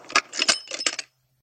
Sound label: Reload Ice Gun